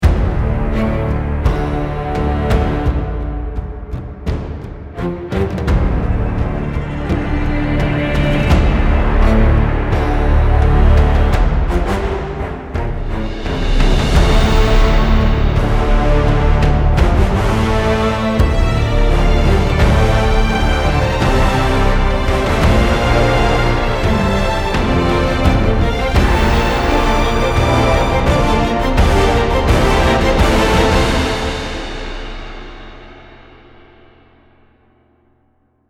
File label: loading screen